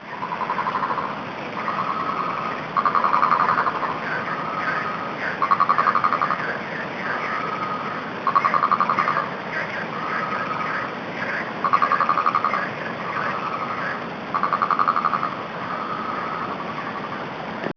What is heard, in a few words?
ourika frogs